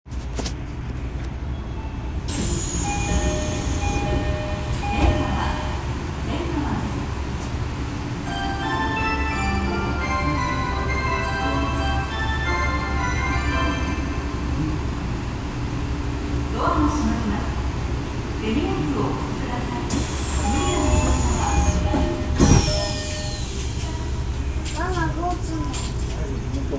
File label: train sounds; 有楽町線千賀川東